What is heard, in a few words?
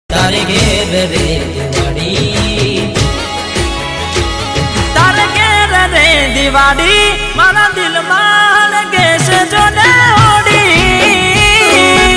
ringtone; happy diwali